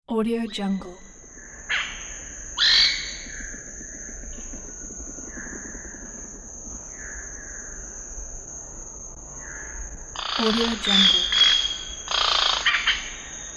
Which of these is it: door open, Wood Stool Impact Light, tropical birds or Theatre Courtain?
tropical birds